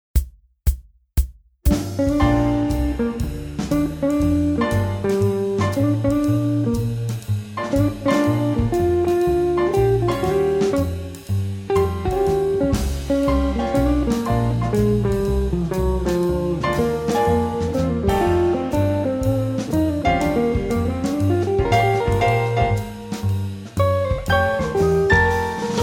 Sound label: guitar blues swing; transcribing solos